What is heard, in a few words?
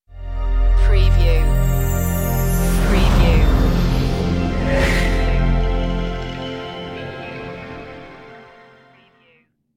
idents